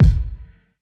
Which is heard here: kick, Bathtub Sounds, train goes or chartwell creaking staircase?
kick